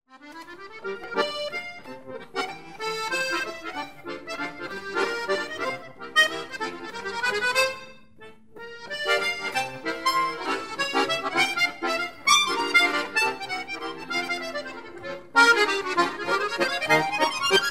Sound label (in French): musette